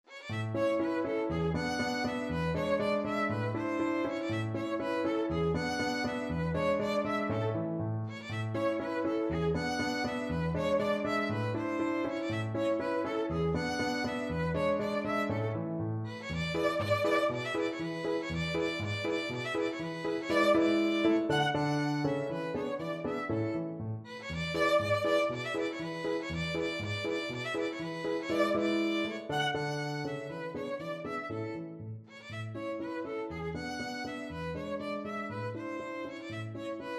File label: ecossaise violin